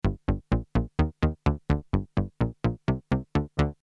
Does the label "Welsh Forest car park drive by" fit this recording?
No